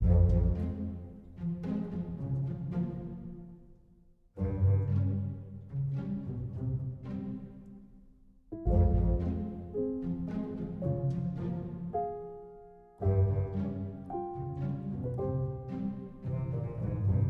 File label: Slime Calm